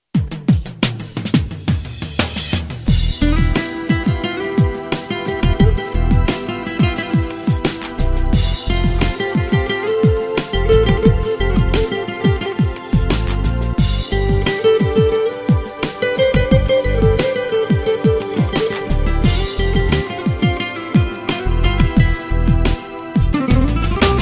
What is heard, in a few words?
Beautiful Ringtone